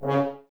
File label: Brass F.Hrns C#; Miroslav Vitous - Woodwind Brass Ensemble